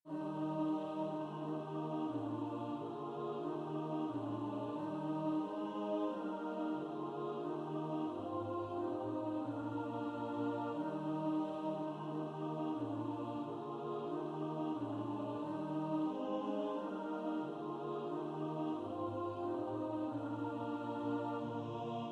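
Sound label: alto, SATB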